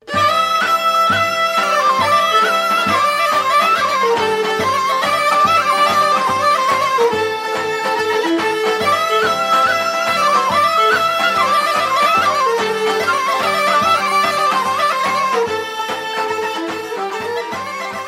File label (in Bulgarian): Strandja Horo, Bulgarie